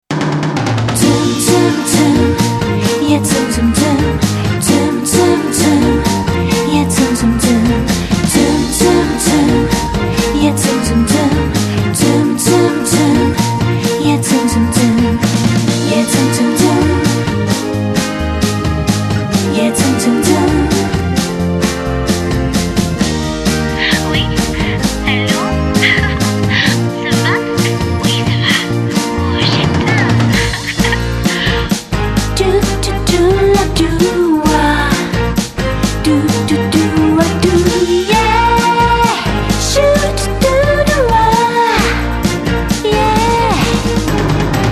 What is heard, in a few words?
french pop